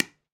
Pickelball Paddle Hit Ground Light, Ball Collision